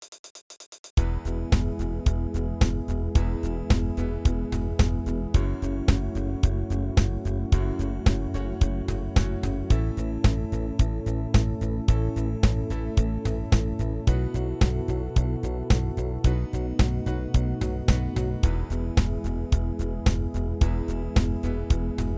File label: Straight Rock Airy Rock 110